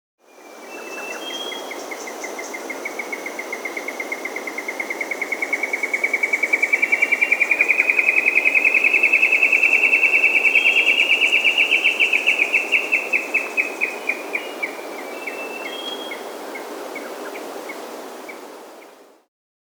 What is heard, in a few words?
Tyrannine Woodcreeper (Dendrocincla Tyrannina), Andean Birds; S. America